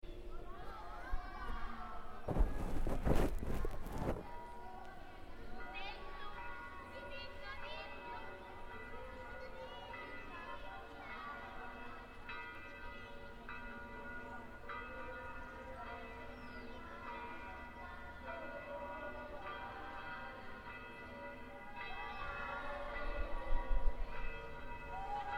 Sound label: Terrace Rome Italy